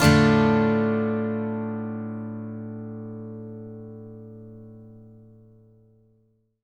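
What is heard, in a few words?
Acoustic guitar D minor up, Country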